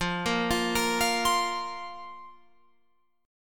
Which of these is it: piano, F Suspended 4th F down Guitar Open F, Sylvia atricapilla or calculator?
F Suspended 4th F down Guitar Open F